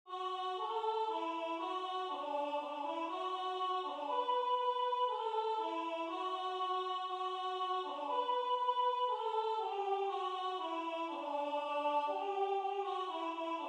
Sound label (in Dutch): Sopraan